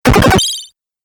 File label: 8 Bit Aggresive Laser Bleep; Video Game Sound Effects